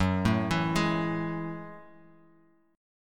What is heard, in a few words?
Gb Minor 9th, Bass Standard; arpeggiated; JGuitar